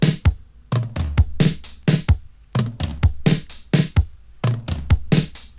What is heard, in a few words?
86 bpm modern breakbeat sample d sharp key, Free drum loop - kick tuned to the D# note.